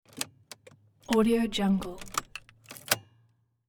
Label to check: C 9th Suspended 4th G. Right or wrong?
Wrong